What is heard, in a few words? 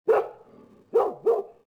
Dog Bark; Soldier Of Fortune II Double Helix; XBOX; finca